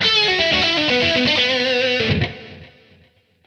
122 lead

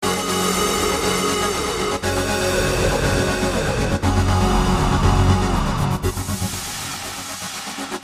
Goa Wild Filters

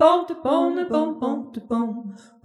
Jazzy Harmony

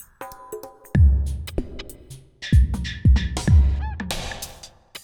Deep Mover 95

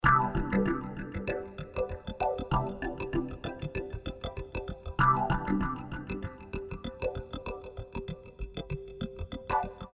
Swinglefunk

